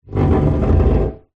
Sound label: household stool metal framed wood seat drag solid floor